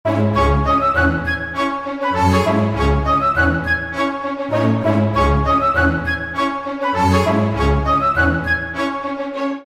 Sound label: Harbor, Jingles; iLife Sound Effects